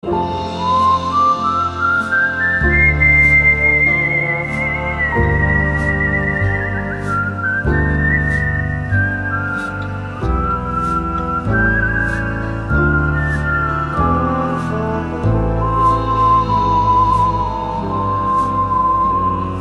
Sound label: whistle